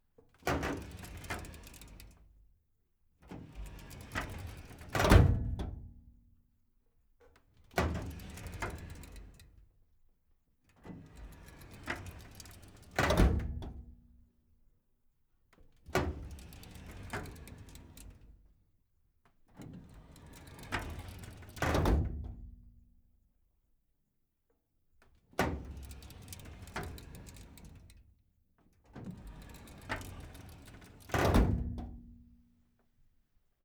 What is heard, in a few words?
Filing cabinet, metal, close, slow open and shut, bottom; Tone Manufacture; MicroTone Filing Cabinets